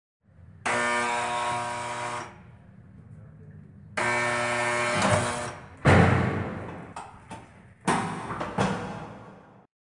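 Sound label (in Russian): у соседей режут дверь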